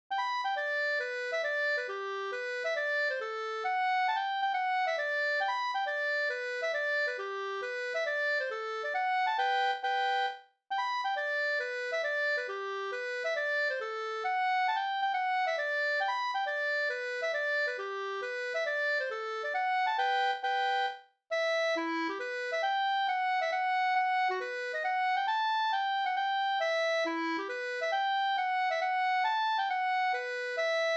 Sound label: mazurka; Kvintilaten av Erik Dannberg fi